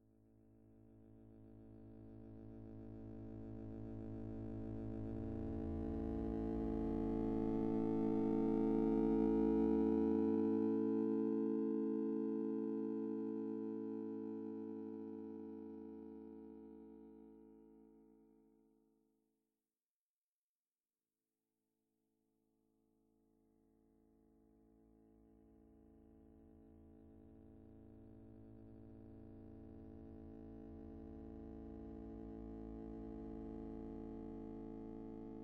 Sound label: Background, Flooded Grounds